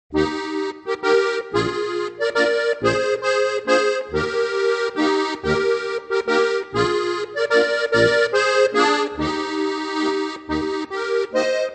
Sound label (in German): landler; Mein erstes Spielbuch